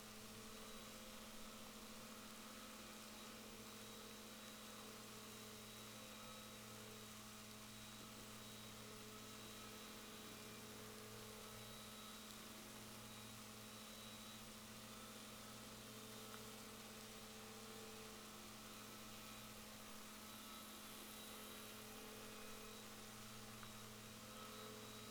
rorquals positif, Balaenoptera Physalus Chile

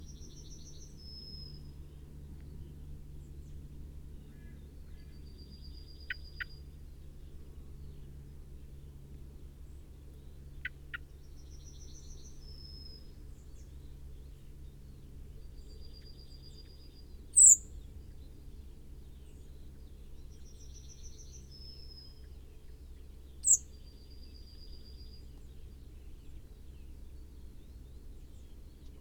Turdus merula; SETE

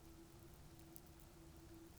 pulses, BALAENOPTERA; MONACO 2022-07

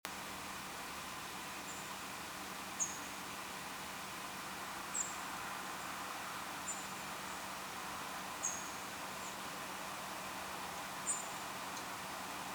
Erithacus rubecula; SETE

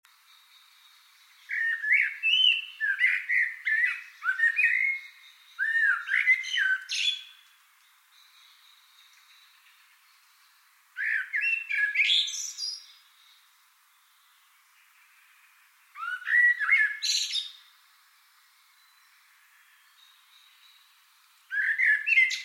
Turdus merula; SETE

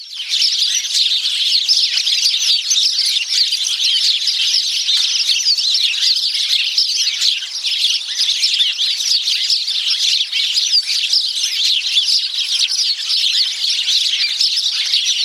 Passer domesticus; SETE

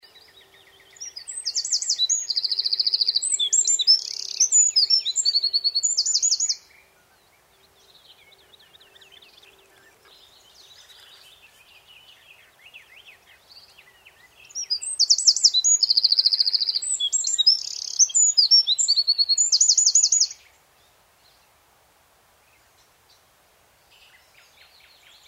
Troglodytes troglodytes; SETE

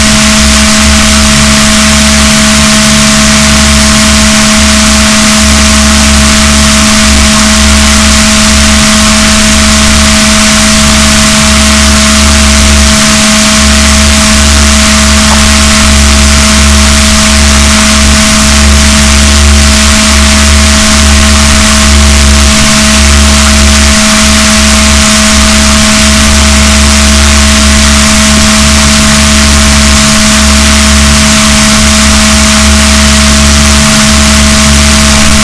rorquals positif, boat, BalaenopteraPhysalus; Balaenoptera Physalus Chile

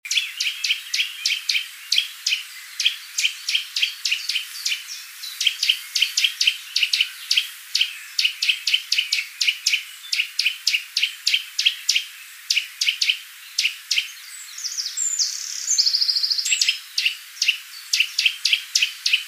Sitta europaea; SETE

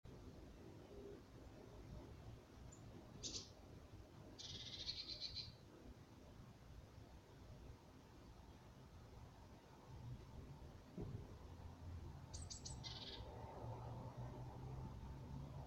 Cyanistes caeruleus; SETE

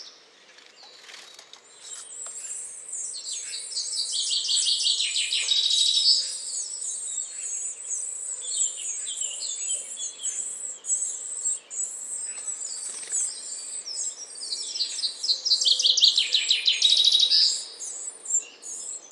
Parus major; SETE

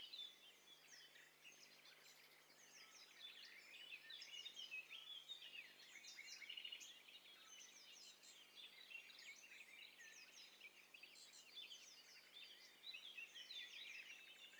STEREO BIRD COCKTAIL PARTY

